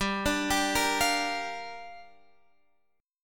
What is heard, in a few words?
G Minor 7th, Guitar, Standard; Gm7 arpeggiated; JGuitar